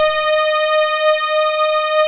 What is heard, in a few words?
SYNTH LAYER; InVision Interactive; Keith Emerson; The Most Dangerous Synth and Organ